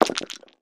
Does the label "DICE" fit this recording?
Yes